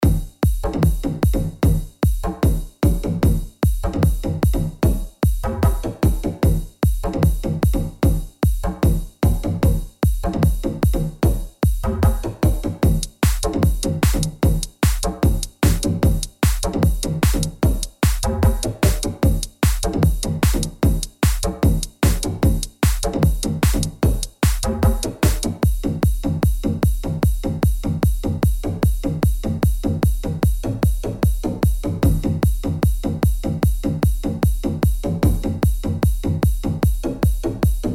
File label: new hardbass